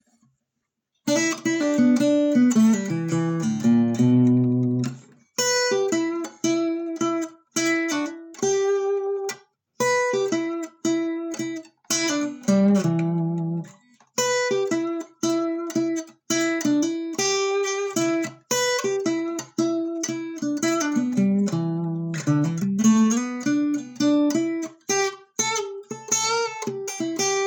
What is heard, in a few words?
blues chorus; daddario gypsy strings